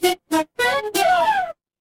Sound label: feedback level up